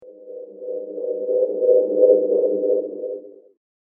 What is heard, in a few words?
The Whoosh Of The Future; whoosh sound effect